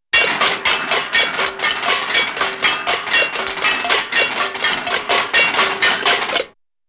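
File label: Funny Clock Or Machine with click - Clocks & Metallic Dings, cartoon; Hollywood Edge; Cartoon Trax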